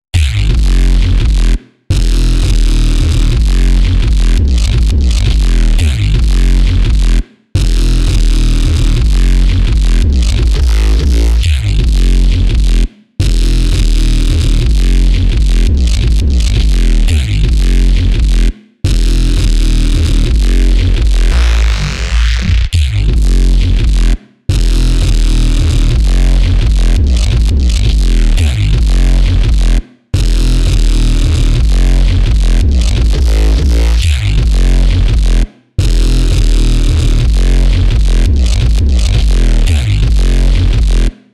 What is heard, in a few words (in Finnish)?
bassline